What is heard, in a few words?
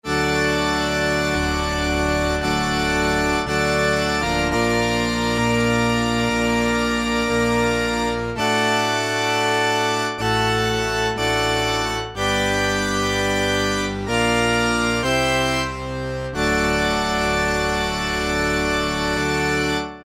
CATHEDRL, Kawai SX-240